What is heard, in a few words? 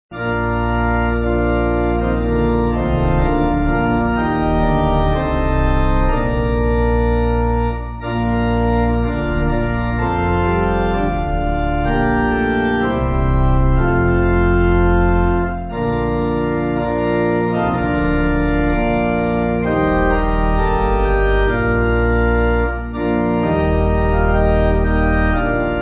Pipe Organ, Music Backing Files for Churches